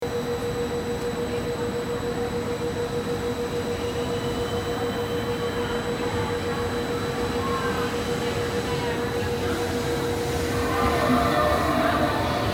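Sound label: railway station Italy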